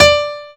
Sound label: PIANO; SoundFactory